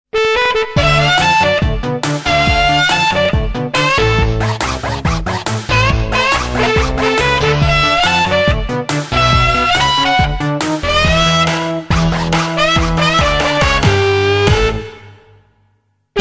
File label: Instrumental